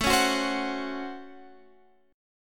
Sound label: C sharp Major 7th Suspended 4th Sharp 5th, A